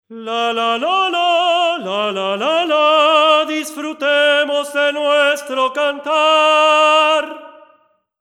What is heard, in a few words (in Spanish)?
voz, Este bolero; lo canta Inés, la bruja buena que quiere enseñar a todos a escuchar